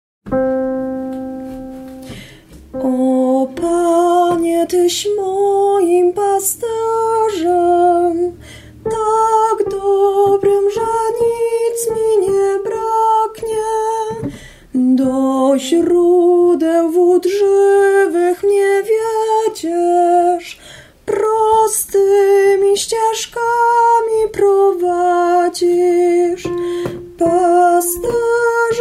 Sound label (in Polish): SOPRAN; O Panie Tys moim Pasterzem